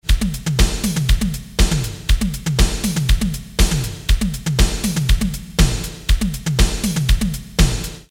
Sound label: drum